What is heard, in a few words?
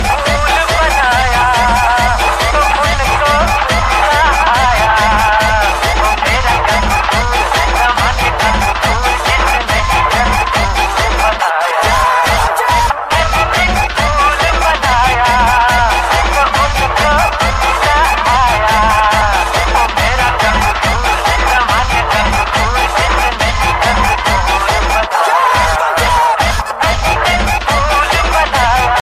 new bollywood famous music ringtone